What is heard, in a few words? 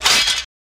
Sword Being Removed; swords